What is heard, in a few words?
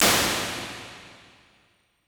Plates Plate C, impulse responses; Ableton Live 12 Lite